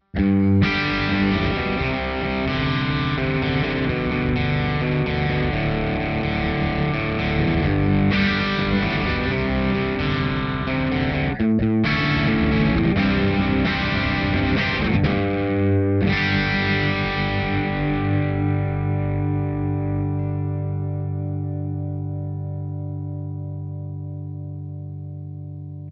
Rock Overdrive; TwinR; ReTube Custom Shop

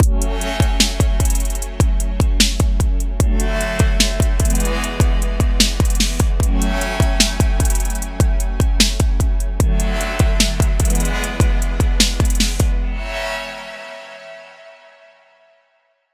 hip hop trap beat dry, Deep kick drums, snappy s; snares, and fast hi-hat rolls; presence

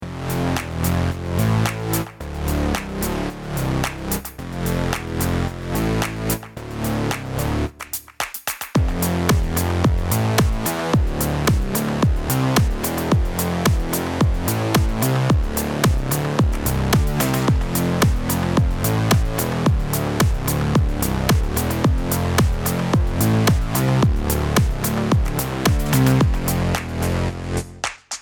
Synth DRY

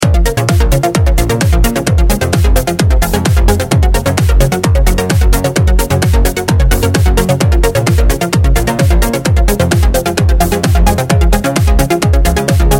PolyJam Synth Arp DRY

